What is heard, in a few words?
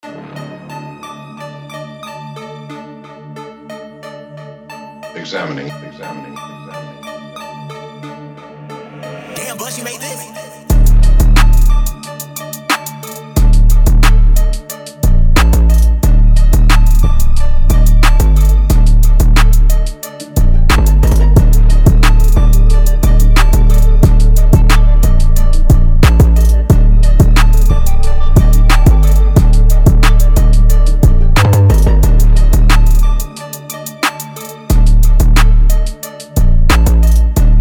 horror